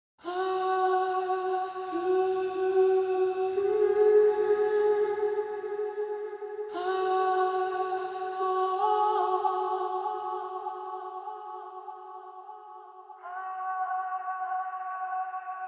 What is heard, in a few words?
74 BPM C# Min Vocal, Lofi; Melody Loops; Amber Eyes; Cymatics; ANALOG EVOLUTION Beta; Lofi Collection